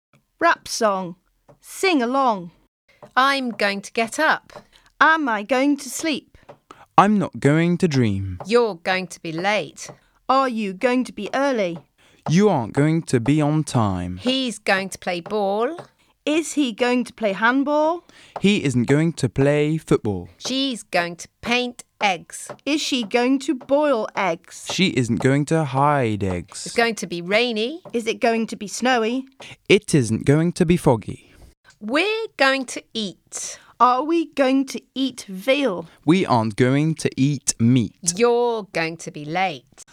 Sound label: Rap song